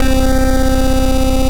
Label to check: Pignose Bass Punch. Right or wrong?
Right